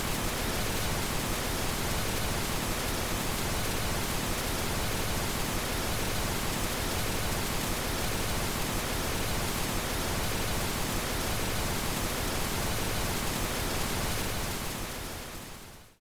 TV Pink Noise; SoundsCrate

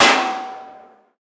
bullet impact hollow

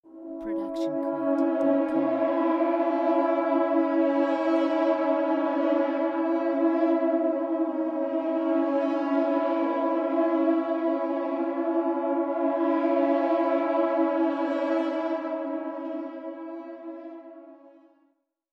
Wailing Horns; SOUNDSCRATE

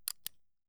Cowboy Revolver Cocking; SoundsCrate

